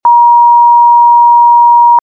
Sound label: Steady